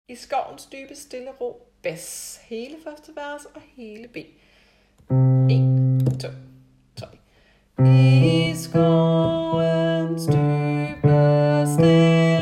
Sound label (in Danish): bas